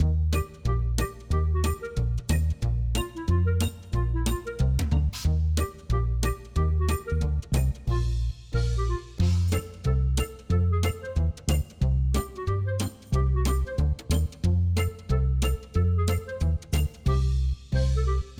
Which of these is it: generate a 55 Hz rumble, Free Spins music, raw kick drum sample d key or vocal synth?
Free Spins music